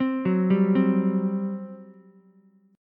G Major 7th Suspended 4th, G, down, Ukulele; GM7sus4 arpeggiated; JGuitar

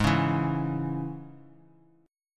G sharp Suspended 4th Sharp 5th chord, down

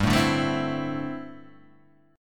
D 9th Suspended 4th, chord

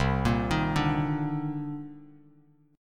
C Minor 9th, Bass Standard; Cm9 arpeggiated; JGuitar

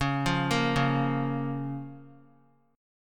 C sharp 6th C sharp down Guitar; C#6; arpeggiated; tuning=4E,4A,5D,5G,5C,6F

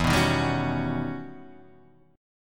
E Minor Major 13th E Guitar Open D

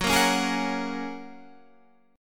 Gb Major Add 9th, down, Guitar, Standard; strummed; JGuitar

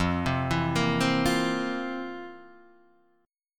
F Major 7th Suspended 4th Guitar Half Step Down

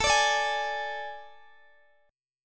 A Major 7th Suspended 4th A, Guitar, Open D

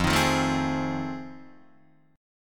F 7th Sharp 9th, Guitar, Open D

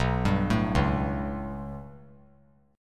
B 7th Suspended 2nd Sharp 5th down, chord

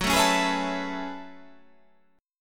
F sharp Minor 11th, guitar; chordshape; down

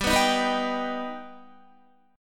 G Augmented, Guitar, chord; strummed; 14,14,15,16,15,15; 4F,4A,5C,5G,5C,6E

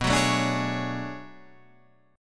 B Minor 13th B Guitar Half Step Down, chord

